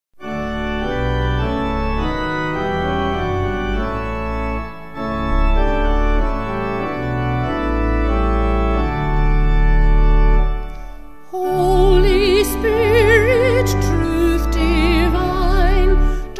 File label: Holy Spirit Truth Canterbury Vocal Organ